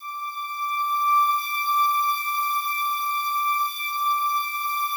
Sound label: slow strings D5, Yamaha VP-1; 90 s Sample CDs; Music Store